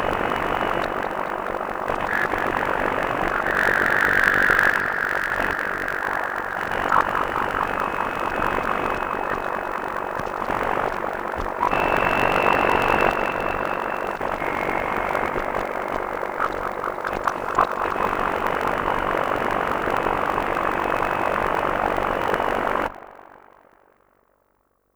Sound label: Filtered Noise, radio signals